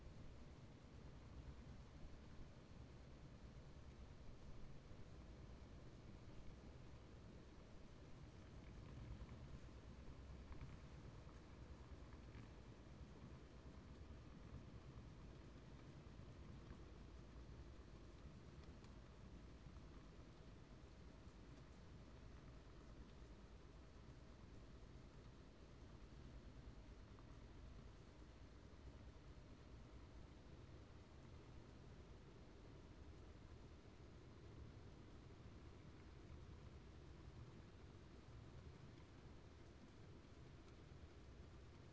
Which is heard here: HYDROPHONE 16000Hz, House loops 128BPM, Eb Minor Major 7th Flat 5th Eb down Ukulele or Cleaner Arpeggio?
HYDROPHONE 16000Hz